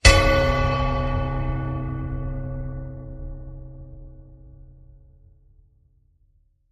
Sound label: Bell Strike